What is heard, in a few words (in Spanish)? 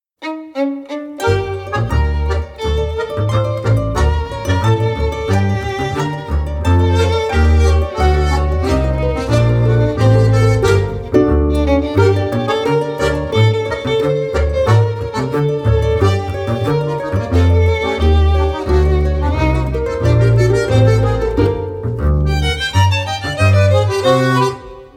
milonga